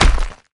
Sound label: clap; smite; geb